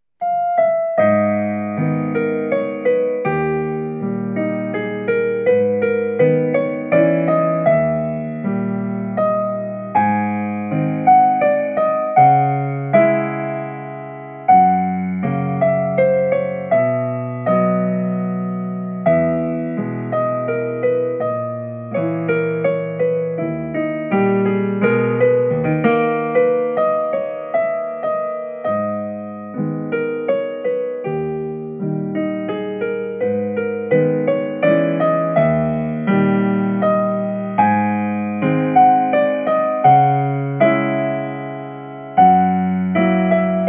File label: sad song